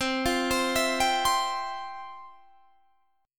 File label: F Major 7th Suspended 2nd, C, guitar